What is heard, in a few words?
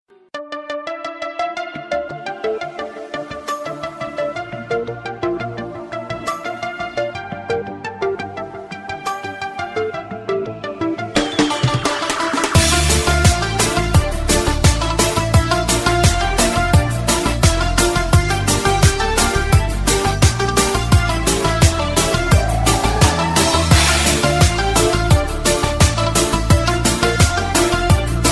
best tone ever